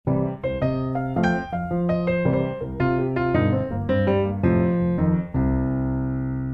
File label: bluenote superimpose